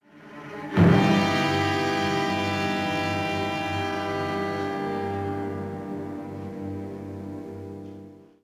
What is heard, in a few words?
Suspense, music; Suspense 1; Star Trek TOS